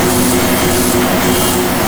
virulent slash; LoL; skarner

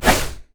dash spin; LoL; vi

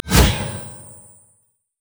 eye of the storm; LoL; janna

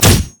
rampage; LoL; hecarim

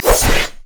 double strike

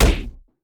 yeti vs flesh; nunu

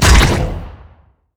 elastic slingshot; LoL; zac base

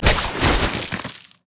snowday minefield land; LoL; ziggs snowday

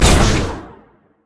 dash; Vi

